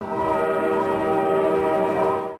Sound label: train; torchlight